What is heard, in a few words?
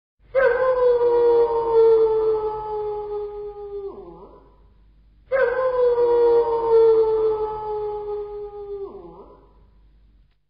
Coyote Howling